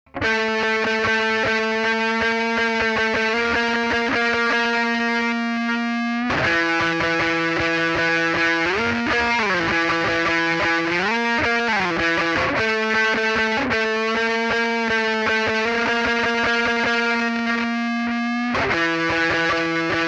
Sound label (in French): guitare solo